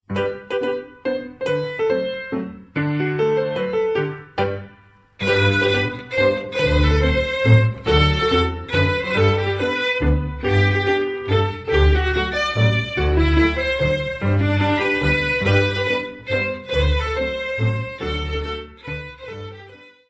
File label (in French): Mazurka van Hofstade, Belgique